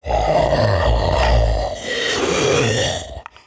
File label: Male Zombie; Zomboid 41.78.16; Project Zomboid